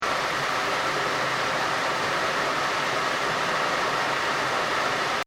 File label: PUMPING STATION HOT SPRING EXHAUST FLU, Japanese hot spring pumping system; Machinery (Sound Effects:Machines)